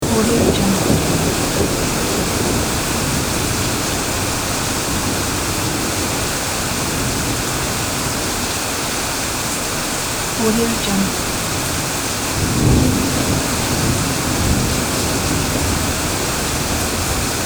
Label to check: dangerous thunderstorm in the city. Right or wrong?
Right